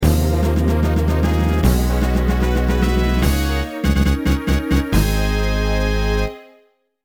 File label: Fanfare; Browser Fanfare; Ranger